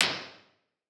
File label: curtain closed